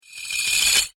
Dragging Metal Blade Whoosh

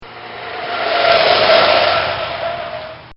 Car Cornering Tires Squeal

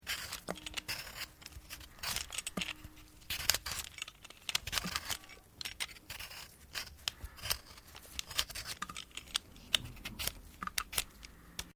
POTATO PEELING; Various food preparation; Restaurants

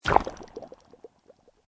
Sweep Organic Fast

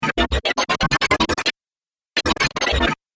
Dubstep Scifi Effects

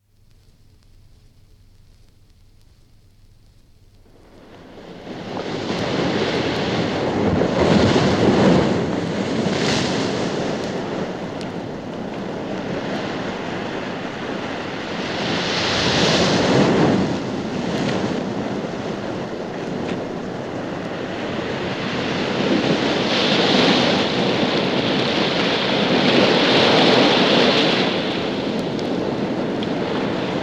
Rough Se, Rough sea pounding against sea wall; from 78 disc; Ocean; BBC

